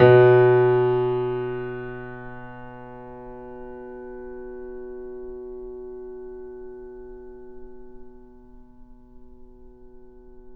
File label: Mp B 2 off, Grand Piano off mic